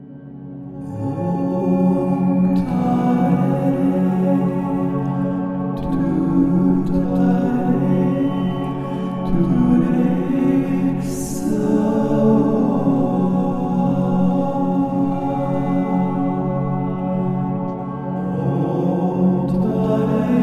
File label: TARA MANTRA